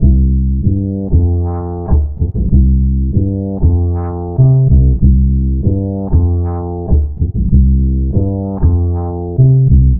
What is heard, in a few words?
Remixed Acoustic Bass